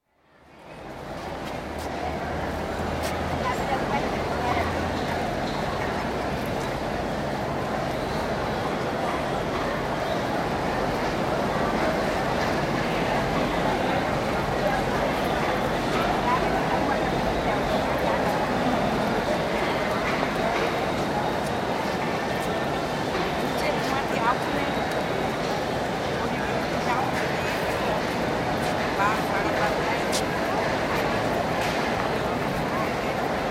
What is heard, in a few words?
Mumbai: Churchgate Station, crowd atmosphere at rush hour, with noisy footsteps, trains, whistles & indistinct speech; BBC